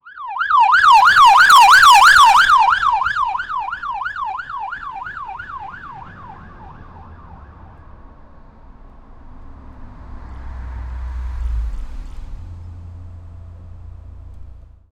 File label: police on signal pass by, emergency services